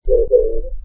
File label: pigeon